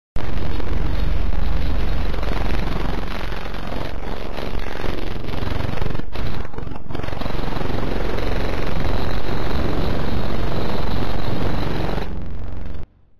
Signal Audio

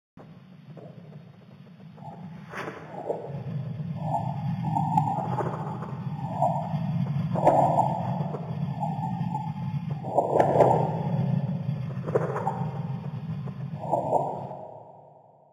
Signal Asteroid XL

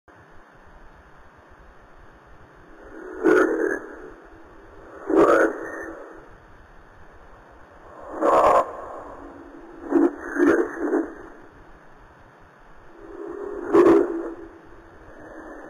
Signal Flesh Audio

